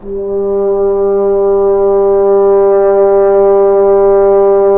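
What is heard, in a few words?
brass, F.Horn Solo pp; Roland L-CD702; F.Horn 3 pp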